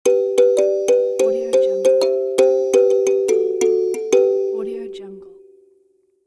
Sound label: musical kalimba caribbean transition